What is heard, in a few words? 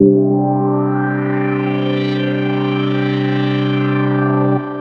Grain organ, keys